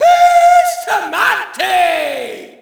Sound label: Shout, audience; UFC Tapout 2